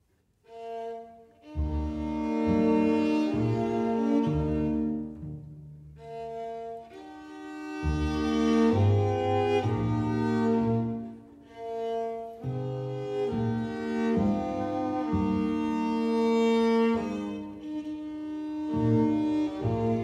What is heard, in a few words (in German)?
Sinfonie B Dur Andantino